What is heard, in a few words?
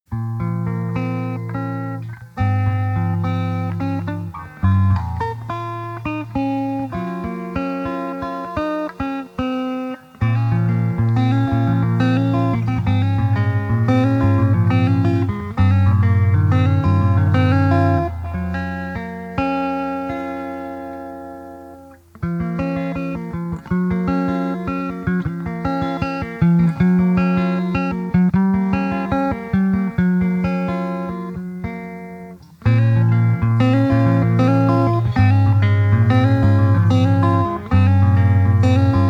Guitar Finger Picking